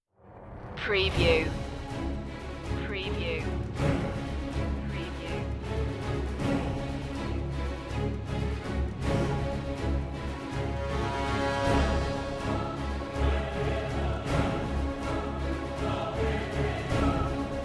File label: full mix